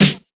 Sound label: prominent acoustic snare sample g key